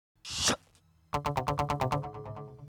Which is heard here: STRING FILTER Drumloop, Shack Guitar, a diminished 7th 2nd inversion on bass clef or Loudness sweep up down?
Shack Guitar